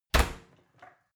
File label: oven close baking sheet ringing